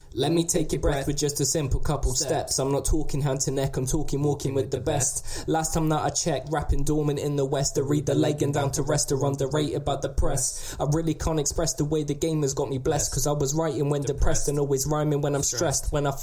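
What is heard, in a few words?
hip hop 90 D minor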